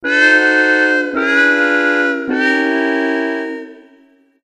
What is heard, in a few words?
defeat, jingle